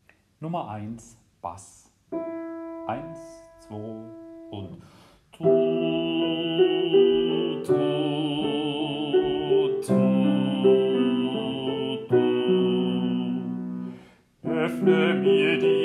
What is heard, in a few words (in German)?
Bass; Dioezesan Caecilien Verband; DCV Chorfahrt; Übaufnahmen